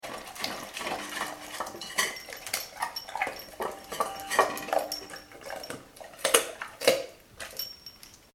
animals dog labrador eat chicken bones in metal bowl; Dog Eating Bones From Bowl; Dogs; McKinney Sound